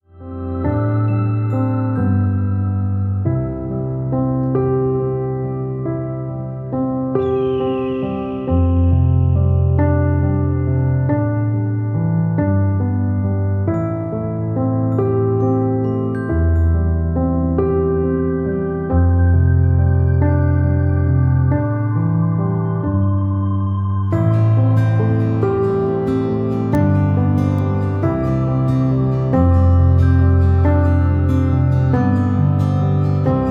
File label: Instrumental